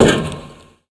foot tin roof, footsteps; Call of Duty Modern Warfare

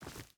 footstep hide forest walk; Rust

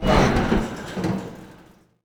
swing strain catwalk

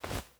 sleeping bag deploy; Rust

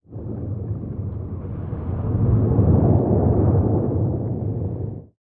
damage hits explosions; World Of Warships

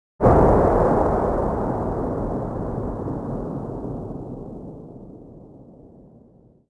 explosion far tail; World of Tanks Blitz

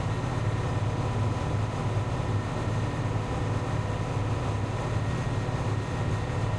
valentine engine int rpm; cannons; War Thunder

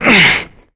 player cliff breaths dangle

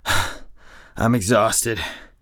tired; Escape from Tarkov

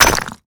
brick; Call of Duty Modern Warfare; physics

